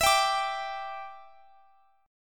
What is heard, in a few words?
E Minor 7th, down; Em7; strummed